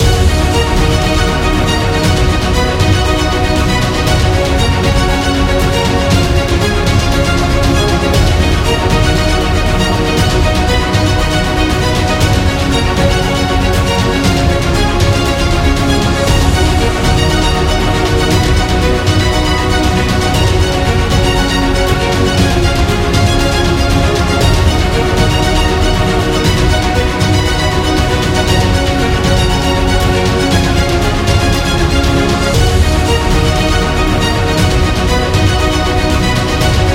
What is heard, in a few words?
Cinematic Loop